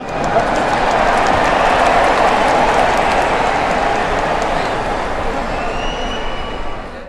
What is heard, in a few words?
cheers